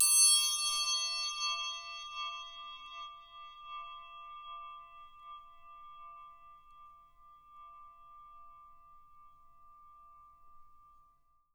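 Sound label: SM TRI PAN left, percussion; Miroslav Vitous - Percussion & Harp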